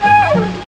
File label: Flute Lick; Strings & Things